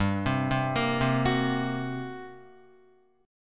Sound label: G Minor Major 7th Double Flat 5th, G, Guitar; arpeggiated; JGuitar